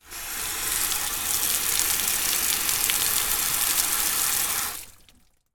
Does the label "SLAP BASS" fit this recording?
No